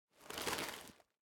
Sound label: armor; Smite; god Kuzenbo v2